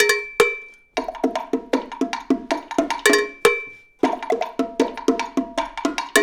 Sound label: Roots Reggae; Sounds Good - Roots Reggae